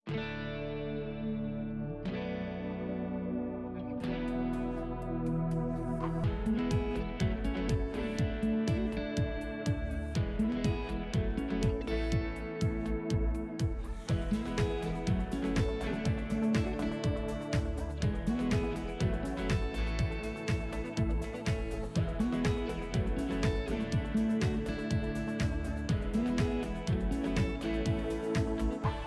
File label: music